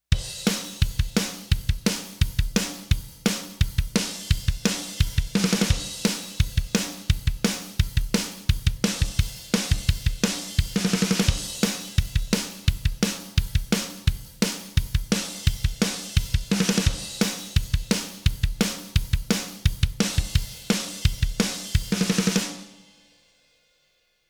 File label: drum Comp On